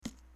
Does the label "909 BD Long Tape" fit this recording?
No